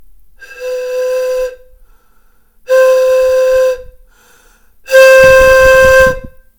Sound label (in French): Quand les sons se font plus ou moins forts